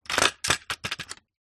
industrial hand tool plastic cheap down on concrete surface